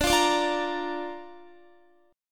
D Minor Sharp 5th, D, down, Guitar; strummed; 4F,4A,5C,5G,5C,6E; Dm#5

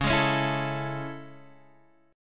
A Major 7th Db

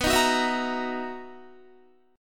B Major 11th B down Guitar; BM11; strummed; JGuitar

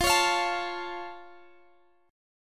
F Augmented, guitar chord

